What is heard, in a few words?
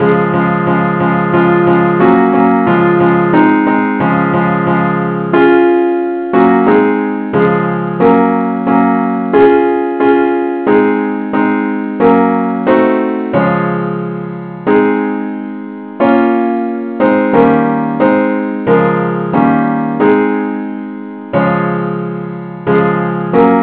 christe Sanctorum simple acc key cis